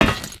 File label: metal plate, human step